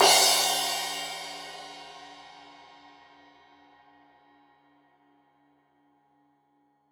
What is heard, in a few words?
Crash 15in L edge, Cymbals Stereo